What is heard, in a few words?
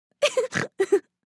Funny woman laugh